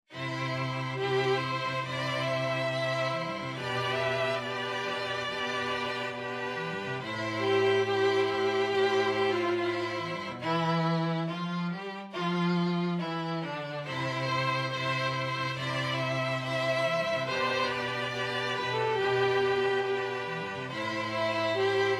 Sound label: STRING QUARTET